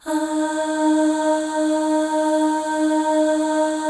Voices, FEM AHS; Spectrasonics; FEM.2 AHS

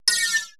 prc f#5, Procussion Mix; Retro Sampler; EMU E-III

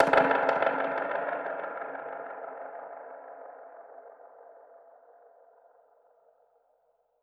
Perc Hit, dub percussion; musicradar

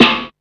snare, techno 138 BPM

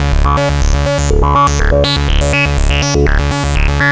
VA Synthesis, Keyboards; Sonic Foundry (Sony Creative Software); Leo Cavallo Bass Taster

